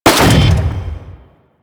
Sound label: Saw Blade Launcher Shot; Warframe